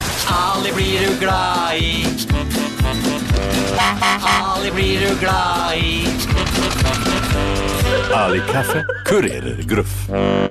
ringetone; children

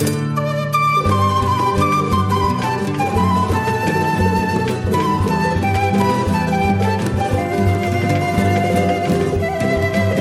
a nice n cute flute; ringtones

